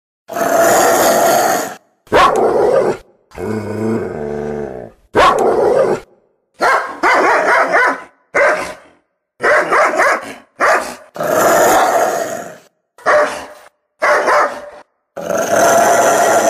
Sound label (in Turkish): saldirgan kopek sesi